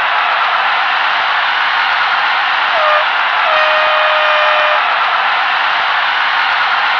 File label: cheer high; kingpin